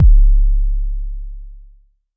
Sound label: Jomox MBase, drum machine; Jomox MBase 01